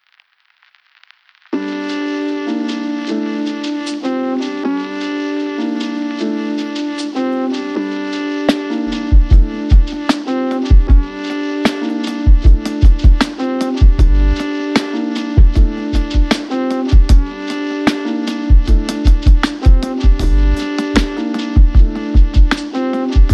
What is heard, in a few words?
Drums; Cru Drums